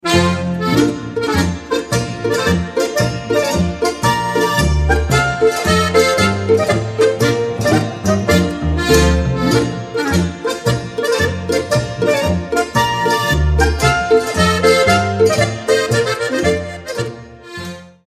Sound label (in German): marsch